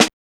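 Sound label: snare drum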